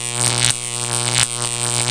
zapper

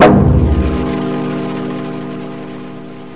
Death Scream; Troubled Souls

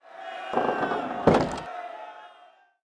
police; PHARAOH

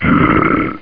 growl; Hexen 2; archer

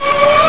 hi jump

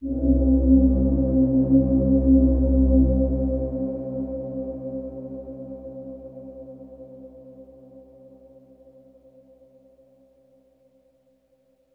synth pad ambient 160 C; Sphere

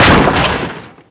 HEAVY, COLLIDE

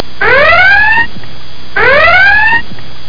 ALERT

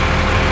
small droid run; WarZone 2100

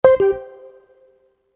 bin button

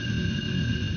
plasma normal; axis

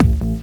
STOP BAS; ALLSTARS; eXtended Instrument

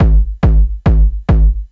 Hard A, Techno Drums; TechnoMusicMaker

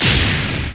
Magic; Majesty; OnlineGame

